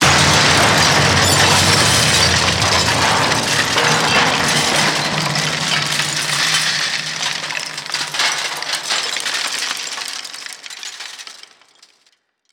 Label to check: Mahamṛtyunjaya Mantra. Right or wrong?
Wrong